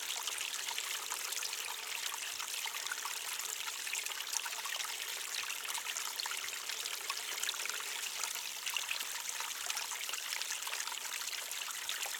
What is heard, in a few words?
Close-Up Running Creek Water Loop; Frogsong